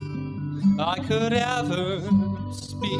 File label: hat